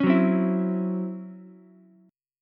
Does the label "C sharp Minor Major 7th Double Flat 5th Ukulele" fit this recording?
Yes